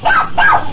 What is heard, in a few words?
houndeye bark group retreat; hl2